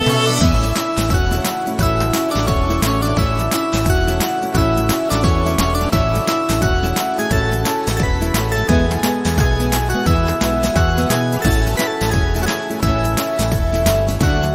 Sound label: hum honge kamyab instrumental